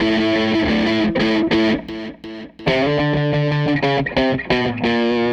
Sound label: Les Guitar Loop